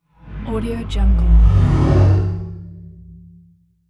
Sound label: deep alien vocal pass by